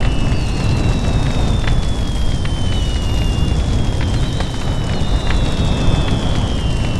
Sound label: Fire Rail Loop